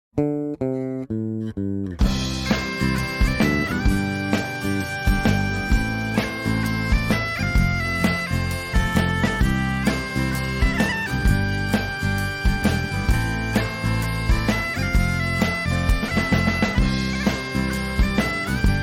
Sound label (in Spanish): Cancion Iphone